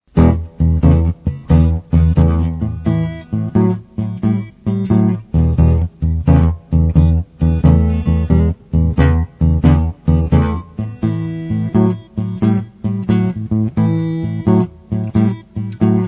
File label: blues guitar